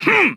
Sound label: kiai; MonsterHunter; Fight